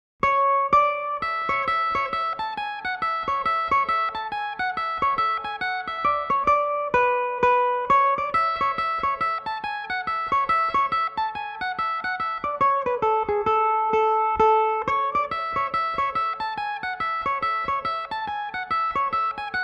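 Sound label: hornpipe A major, Irish mandolin; lamplighters; belmuse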